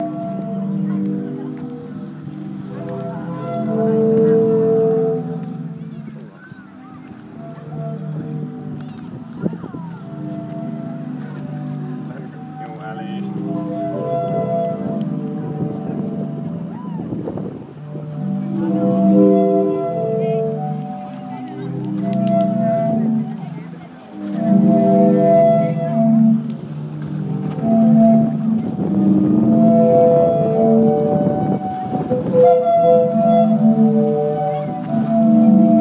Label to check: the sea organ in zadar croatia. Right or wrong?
Right